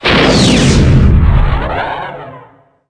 proton torpedo

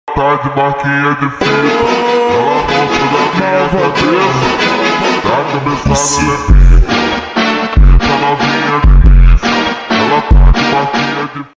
beat phonk

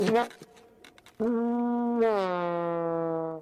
waaaaaaaaaaaawaaaaaaaaaaaaa

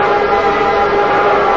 advanced engine; tie; lego star wars

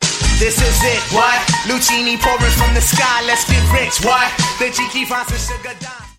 chorus faded

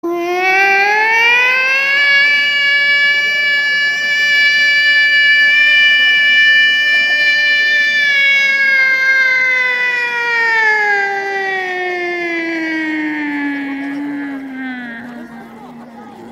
air raid siren; amamiya kokoro

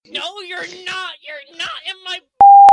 bleep

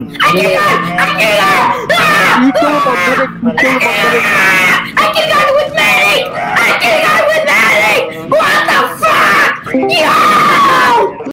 kid screams; roblox

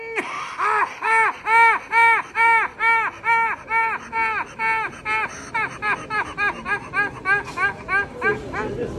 crazzzy old laugh

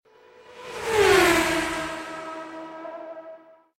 message tone; autodromo

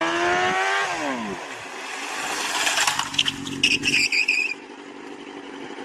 motorbike stopping sound effect

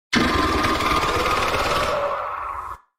roar angered; roblox doors

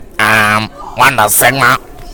goofy ahh; squidward

